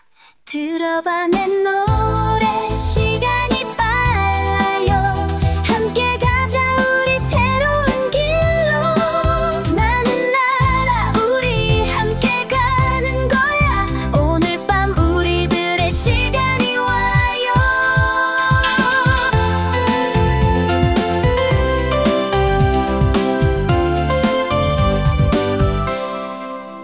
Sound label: njs dance; acestep